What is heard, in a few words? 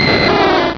cry; source of the sovereign